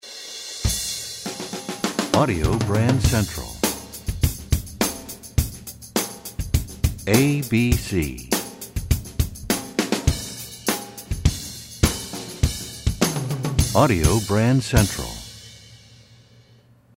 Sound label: Desert Wind, radio jingles